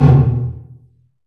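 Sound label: large room 909, reverb; TR 909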